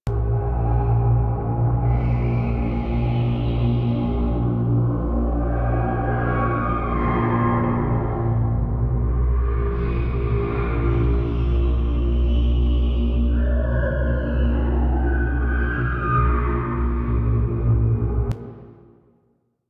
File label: Evil Op Room Loop; Entrati Labs